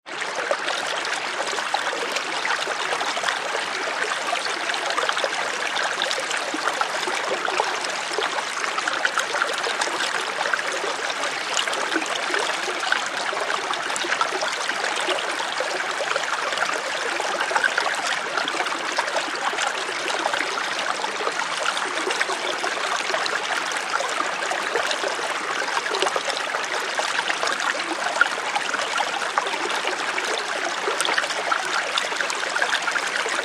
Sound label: Brook CU Hvy Flow Fain, streams